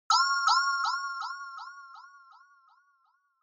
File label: Inspiration High Delay; Onoma